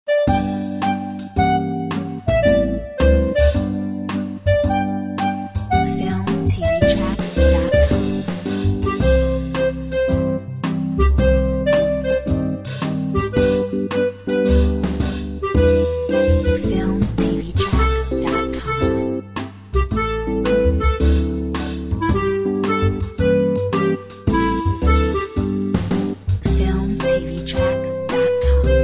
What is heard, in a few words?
110 bpm